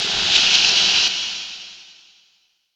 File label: NOIS; XXL 800 Production Effects